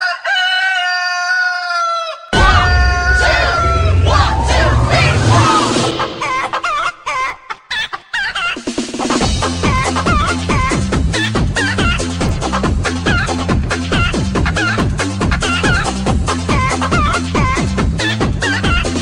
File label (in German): farm, Klingelton